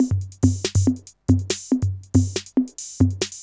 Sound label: techno 140